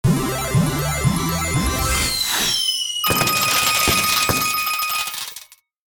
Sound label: Slot machine casino golden jackpot win